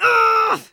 Scout pain severe

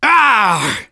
Engineer gunslinger triple punch; final

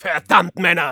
Soldier negative vocalization, de; Verdammt, Männer.

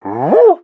Pyro taunt flip exert

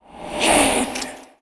Sword hit; "Head!"; Demoman

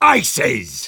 cheers

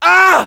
Scout pain severe, fr

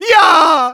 battlecry, de; Hyaaaa!; Medic Sprachkommandos

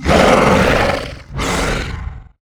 Taunt yeti roar first

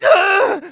pain severe; Scout

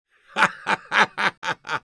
Engineer laugh happy, es; Risa feliz 1

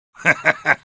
laugh evil, es; Medic

